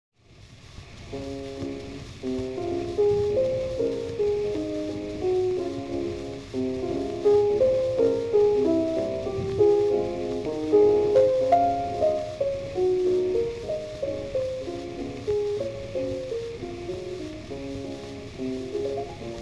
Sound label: Valse Nonchalante in D-Flat Major